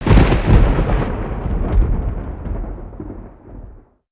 GF Lightning; gnarled forest

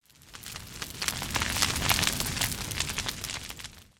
Dragon Crackle sweetener idle; creatures organic

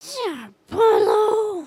sigh

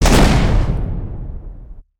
charge

